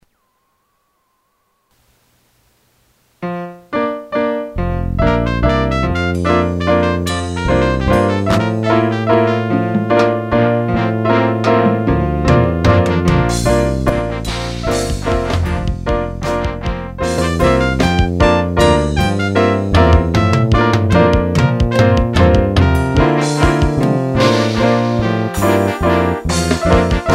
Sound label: Waltz Trumpet